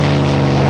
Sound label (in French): avion; PlaneRun